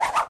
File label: Free Spin Rotation Snd; eldorado